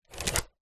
body builder belt tighten do up; sport

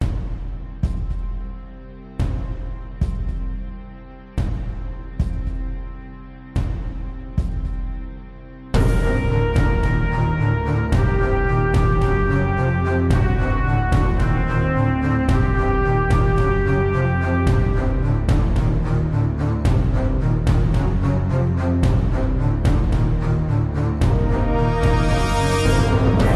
dramatic climax

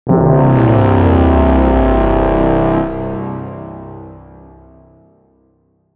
Heavy Stab; soundbits

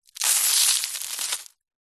foley dust dirt debris small stones drop ground

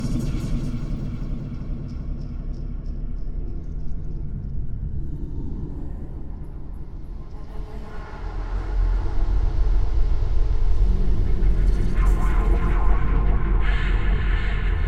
Ambience Hell

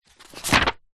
office paper construction sugar page turn book; zapsplat

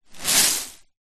rice dry in plastic container tip movement; zapsplat

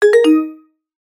app alert tone

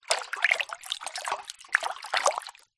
household water stir in metal pan; zapsplat

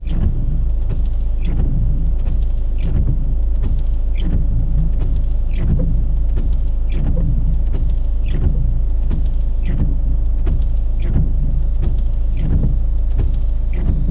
car suv idle rain drizzle wipers; transport

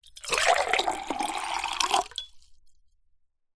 food drink water pour into tumbler drinking glass

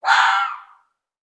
animals fox red mating call single

